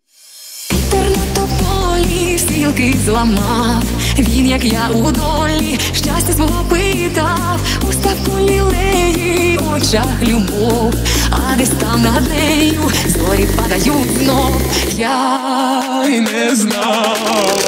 hardstyle remix

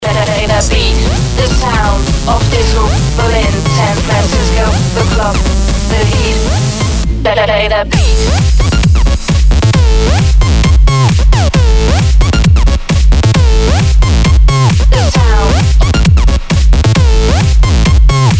electro

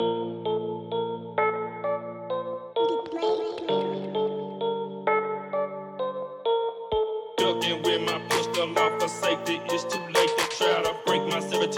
Christmas Phonk

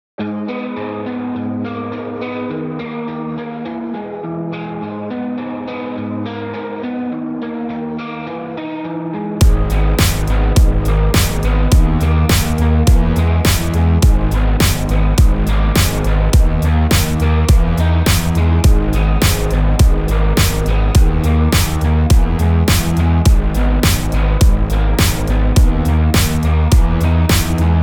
Instrumental

